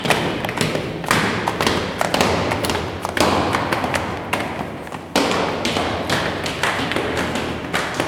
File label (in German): Trampeln im Treppenhaus; Geraeuschequiz